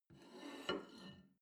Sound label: plate take table slide, gastronomie; berufe; soundpool